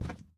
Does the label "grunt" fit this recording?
No